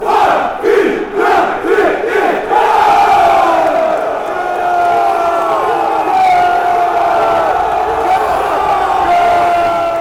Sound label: beerschot chant